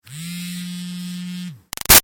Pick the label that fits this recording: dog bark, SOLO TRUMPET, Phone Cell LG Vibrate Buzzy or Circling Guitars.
Phone Cell LG Vibrate Buzzy